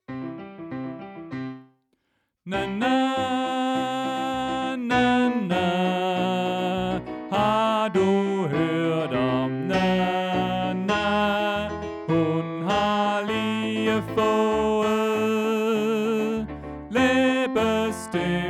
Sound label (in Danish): Tenor; oevefiler